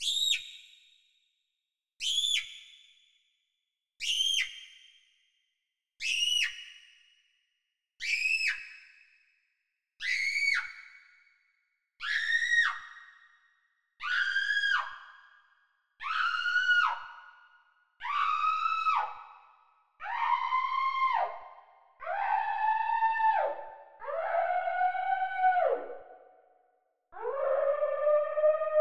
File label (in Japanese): sakebi goe nado; musicbox